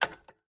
Coffee Pack Close; Game rip; MiSide

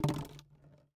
Bottle Drop; Game rip; MiSide

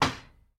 Window Knock; MiSide

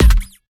Hammer Kick Player; MiSide

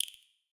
Spider Click; MiSide

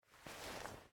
StartUp; MitaCap; MiSide

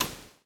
TV Off; MiSide

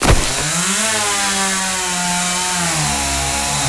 Kill Player Chainsaw; Game rip; MiSide

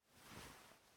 Movement; MiSide

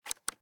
Console Open Cartridge; Game rip; MiSide

